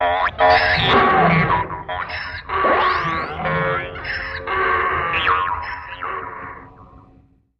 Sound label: Frantic Elect Soun, electronics